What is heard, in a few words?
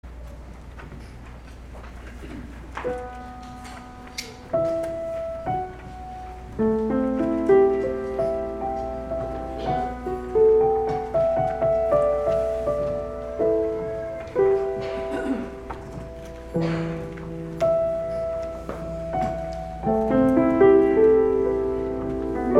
Piano; LecheShrine; Dedication Mass